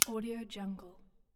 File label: pliers